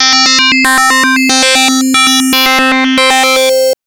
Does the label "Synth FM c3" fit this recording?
Yes